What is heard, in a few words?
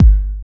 tight lows kickdrum sound g key; kick drum samples